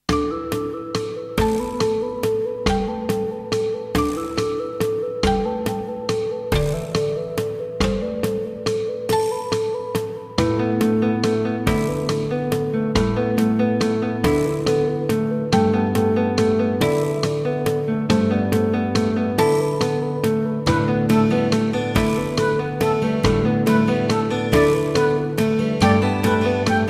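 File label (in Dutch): fusion, Wachten terwijl de klok doortikt, eentonig maar gespannen; AG fusion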